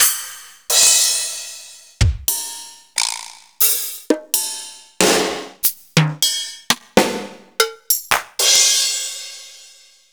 Korg Pro-Wave

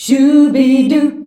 SCHUBIDU D, FEMALES, Hyper Dance

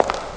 footsteps; ST-XX Amiga Soundtracker Sample Packs

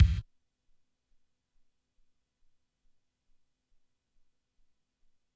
bd, Drum Machines, ELKA X1000; 300 Drum Machines

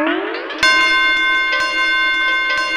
belbells C4

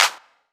CLAPS, JD DRUMS KIT; EdgeSounds; Drum Mashines VOL-1

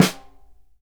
SNR, Synclavier Percussion 2 - World & Orchestral

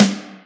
Reverb Snare, Alesis HR16B drum machine; 300 Drum Machines

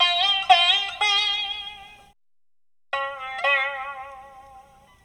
World Lick

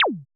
JUNO HIQ, TECHNO; Drums In Your FACE!

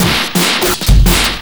LOOP, Drum & Bass, 170 BPM

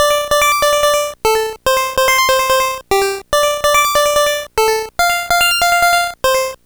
Game Boy Synth; 8-Bit; Chiptune

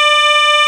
VOCAL WAVE

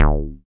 Bass SH-101

